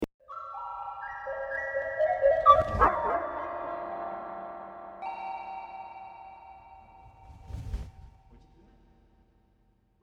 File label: synth sound; the maze